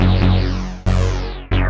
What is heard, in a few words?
awkward synth bass; BASS LOOPS